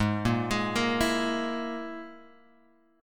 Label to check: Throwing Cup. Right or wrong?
Wrong